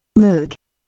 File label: moog